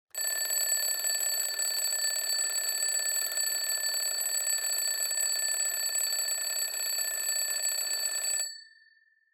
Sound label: Clock Alarm Low